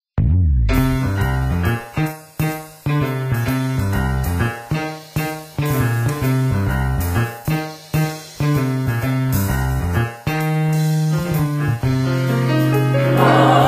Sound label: Some Melody lofi